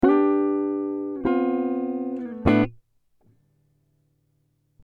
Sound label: Dm 100 GTR Riffs